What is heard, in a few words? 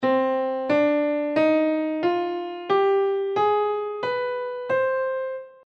harmonic minor scale